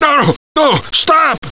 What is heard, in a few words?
hurt